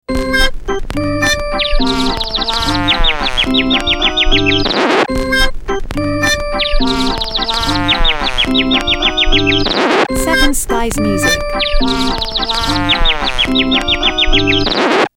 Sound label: Fun time loop